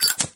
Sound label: Lighter